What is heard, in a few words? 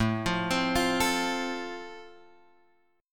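A Minor Sharp 5th, Guitar Drop D, chord strum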